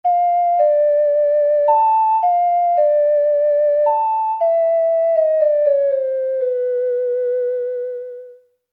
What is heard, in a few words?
ocarina; Majoras Mask Official Soundtrack